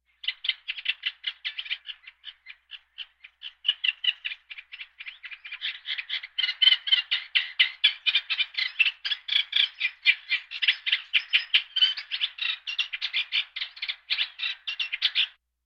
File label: Birds